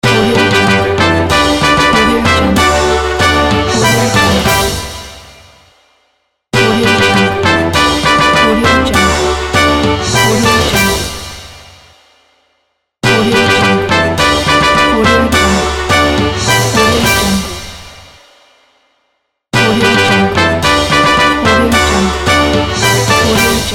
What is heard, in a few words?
fanfare with flexible bpm